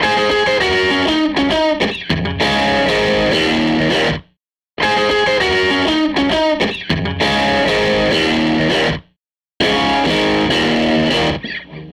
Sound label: Strat Guitar Loop; Sonic Foundry (Sony Creative Software) - Six-String Orchestra